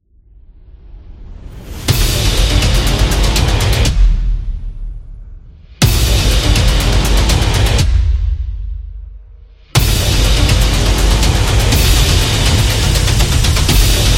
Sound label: Tension